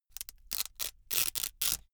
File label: velcro large open slow